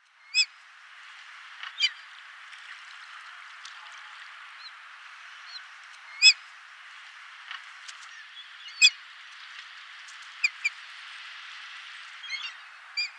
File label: Pomarine Skua call Netherlands